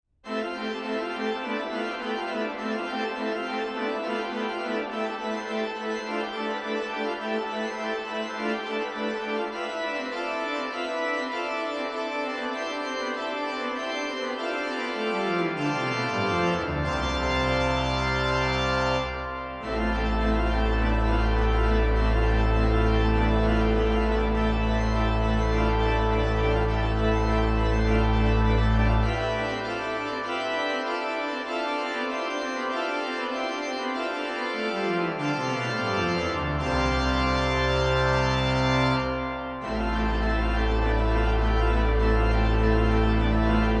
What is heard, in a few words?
toccata, organist